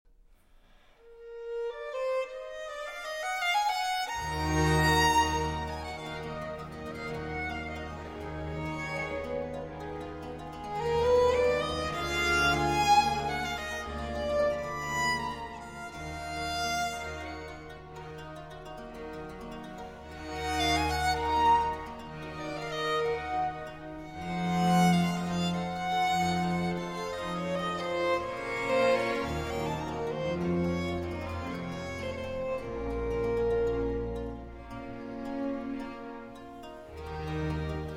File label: Concerto E Minor For Violin, Strings & B.C. II. Adagio